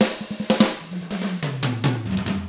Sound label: rock 6/8, drums; ILIO; Double Platinum Drums 1